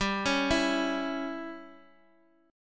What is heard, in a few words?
Db Diminished G